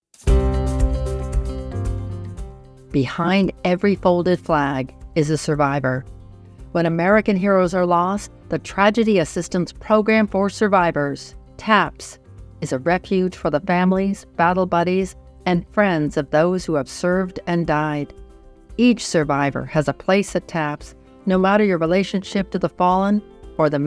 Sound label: taps